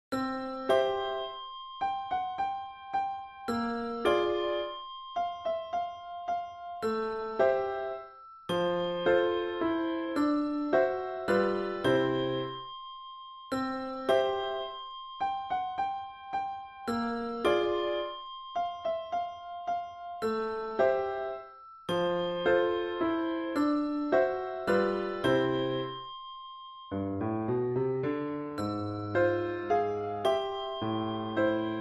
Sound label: Bell